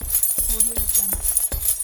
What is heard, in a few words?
running with chain mail loop